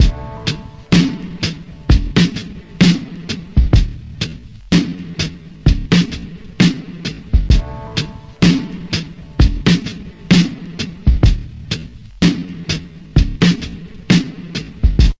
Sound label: 064 bpm stretchy one hit, drum loops and breaks